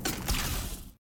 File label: reload